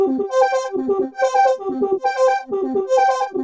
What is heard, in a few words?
vector vox, vectoring; Zero-G Ambient 1